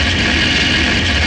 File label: INT scrape, Vehicle; NASCAR Thunder 2004